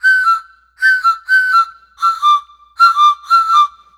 Thai Pan Pipes Rhythm

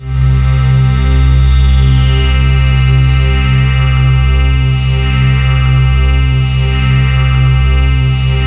PAD8 C3; Explosive Jungle; USB Soundscan vol.05; AKAI

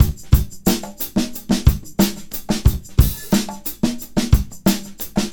Combo, R&B Drums

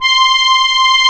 DIATONISC, AKKORDEON

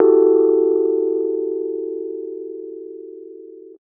125 Rhodes Dom E, Keys, Nu Jazz House

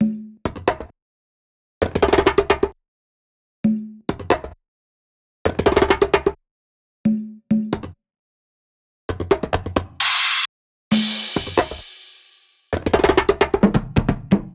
loop perc 132; Seismic Loops

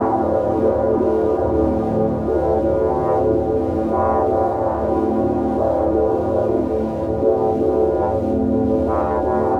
100 D Drone Electrical; Zero-G - Future Wave; Drones

